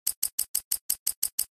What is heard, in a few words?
wheel; Wheel-spin-Games